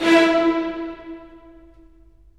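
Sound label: STR, strings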